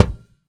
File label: Smack, Kicks; Airwindows